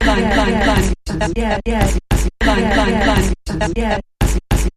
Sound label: Sampled Voice Percussion Variation